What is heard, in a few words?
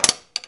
Pinball Hit Metal Gate